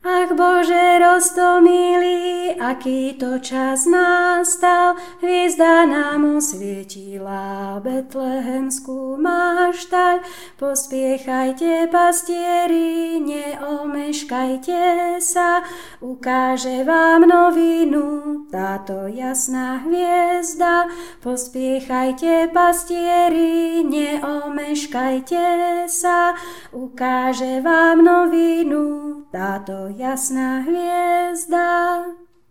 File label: bass, spevokol; Vianoce 2016 - Ach Bože roztomilý